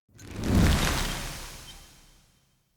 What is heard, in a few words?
Offering Shrine Failure; Midrath; Fey Hub